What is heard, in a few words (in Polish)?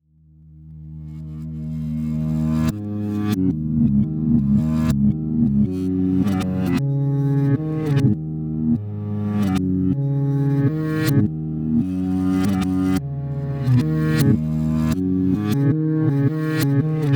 revers gitarowy, Pady; Muzyka Ilustracyjna